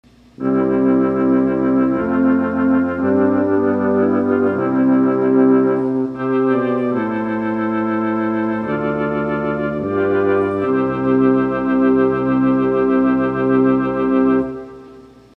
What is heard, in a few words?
Diapason, theatre organ